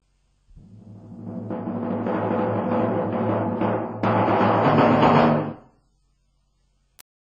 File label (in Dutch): Vel pauken